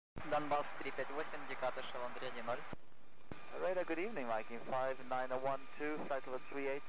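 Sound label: rabota am, AM operation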